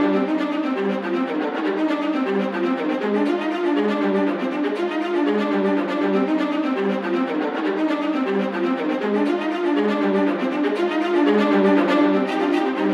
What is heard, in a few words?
viola; виола